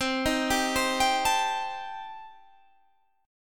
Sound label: A Minor 7th Flat 5th C, chord